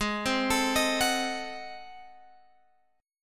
G sharp 7th Flat 9th G sharp down Guitar Open F, chord; G#7b9; arpeggiated